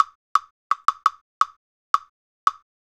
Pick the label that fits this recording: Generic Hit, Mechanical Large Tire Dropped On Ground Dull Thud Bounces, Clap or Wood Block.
Wood Block